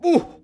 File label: clown hit; Ringling Bros. and Barnum & Bailey; Wii